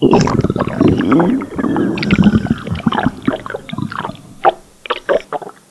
Sfx Ausculation Belly Ill

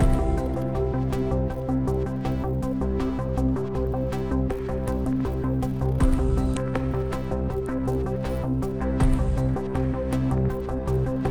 Puzzle 8CH 80BPM

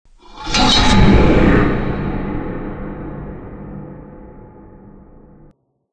Jumpscares Metal Blades Impact; Five Nights at Ronald's

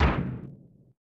Fireworks; Tony Meola's Sidekicks Soccer; SNES

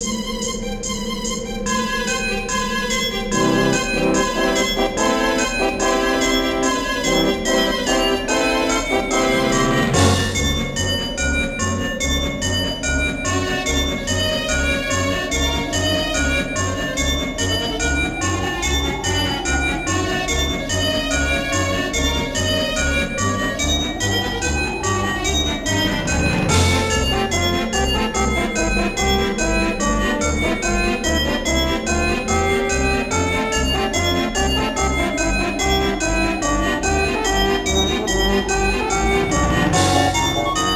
Christmas Bells (with Ambience); Banjo-Kazooie; Freezeezy Peak

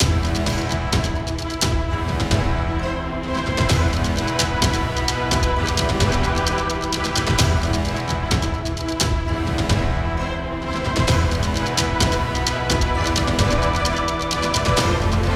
Stage Music

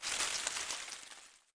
rustle; lego my world first steps

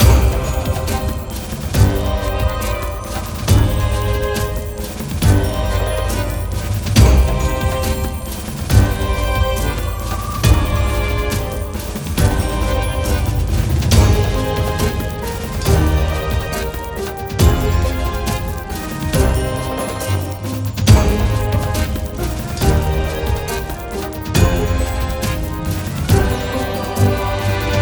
Idle LOOP; RatWorld

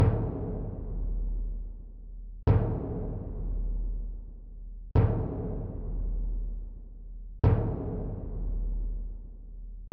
Timbani hit fast; Raji: An Ancient Epic

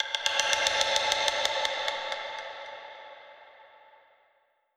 Percus; Koloro

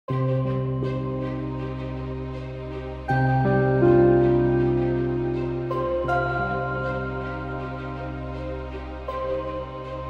Home Music

